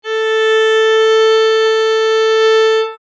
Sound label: Viola